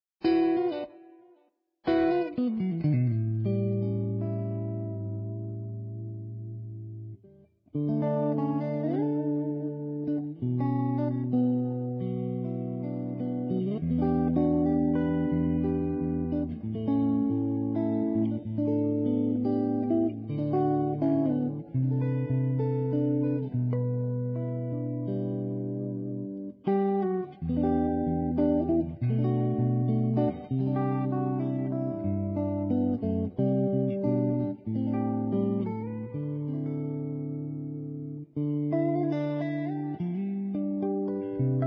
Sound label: Guitar Electric